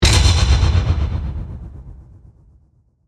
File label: Hit Metallic Chop, Chopper; Metallic, Chopper, Production Element, Imaging Element, Accent, Transition; BlastWave